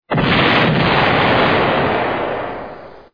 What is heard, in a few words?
explosion sound